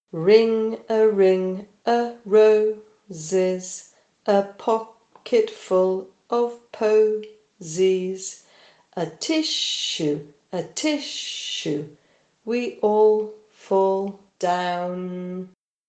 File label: RING A RING O ROSE